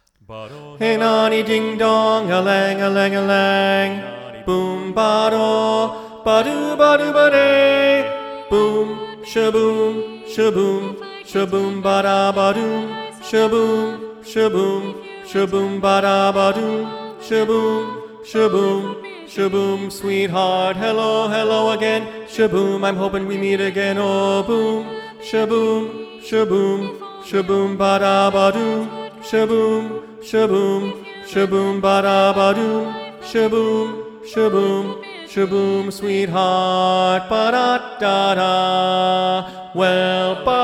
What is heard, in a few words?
TENOR, SATB Tenor Predominant